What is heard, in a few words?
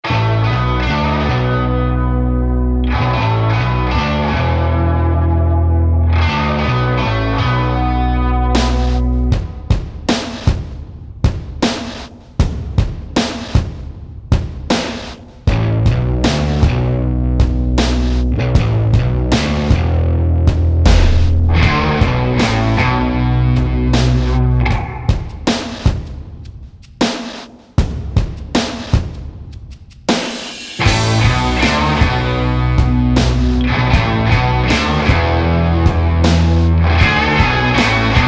BLUES ROCK